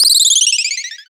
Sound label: Sfx